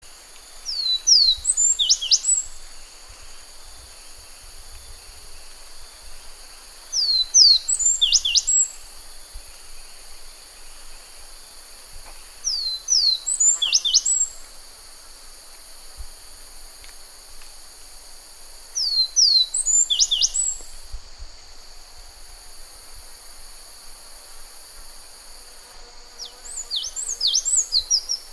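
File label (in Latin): periparus elegans, Sikory; Sikora plamista; z północnych Filipin; ptaki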